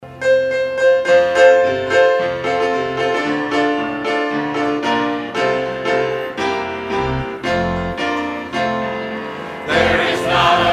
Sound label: Boundless Love Choir